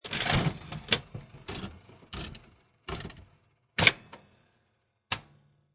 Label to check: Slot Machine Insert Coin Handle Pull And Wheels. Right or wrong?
Right